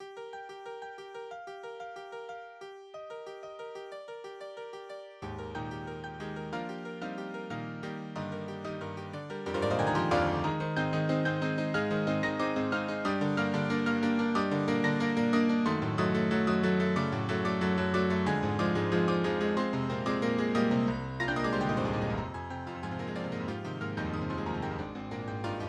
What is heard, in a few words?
piano duo; Official Opening